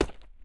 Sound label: walk, footsteps hard surface; elite footsteps